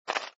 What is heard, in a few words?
roll show, backgammon